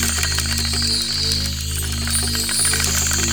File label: Lift Move Loop; Warframe; Duviri